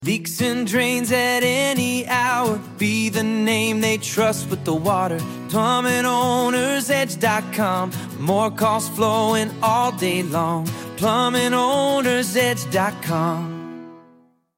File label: jingle